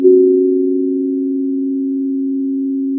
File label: pop, Sound of a phone ringing